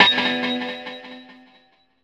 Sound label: Citizens